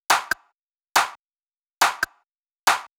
drum Loop 140BPM Clap

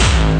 hard bass drumz

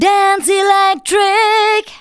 VOCALS SUNG; Techno Trance Essentials

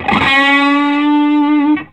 SOLO G, Vintage Blues Guitar

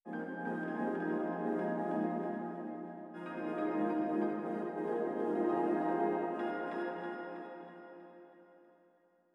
soft piano

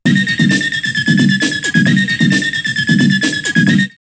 drumloops Rave 132; X-Static Goldmine; 90 s Sample CDs

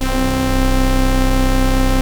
OB VOX C2; Hollywood Edge - Giorgio Moroder Rare Synthesizer Collection; OB 4 VOX.

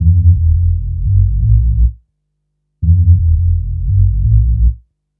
Big Bass, Drum & Bass; Fluid Dynamics

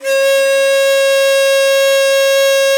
BON DI, Winds, Old World Instruments; E-MU Producer Series Vol. 7 – Old World Instruments

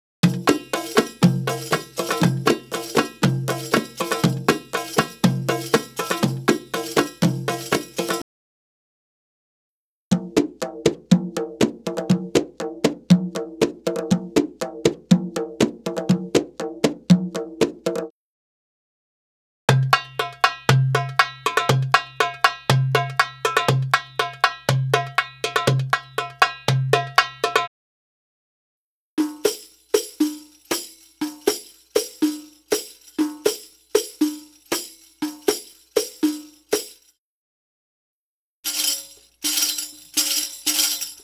Arabic Rhythms

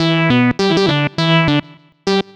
Synth Lead; Trip-Hop; Loops; Sonic Foundry (Sony Creative Software); Rondo Brothers Trip-Hop Loops & Samples; Liberation

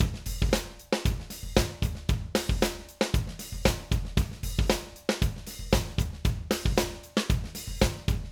Acoustic Live Drum

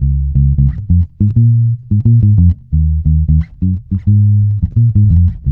C#, dub, bass; Spectrasonics; Bass Legends CD 1; JOY DUB

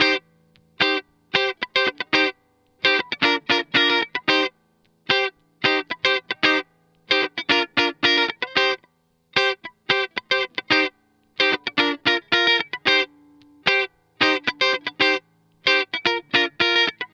112 C DISCO GTR; 112 BPM DISCO TWO C

